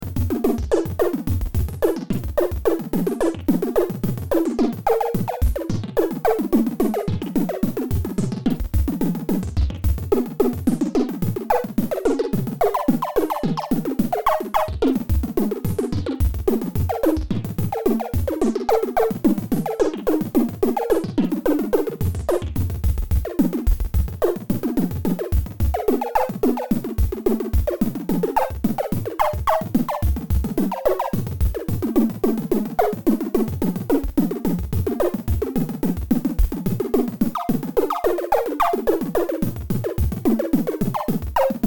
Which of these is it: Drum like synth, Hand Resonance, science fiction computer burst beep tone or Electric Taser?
Drum like synth